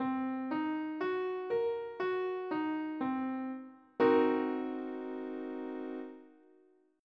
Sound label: C half dim7